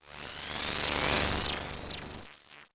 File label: match medium; Audiosurf; circuits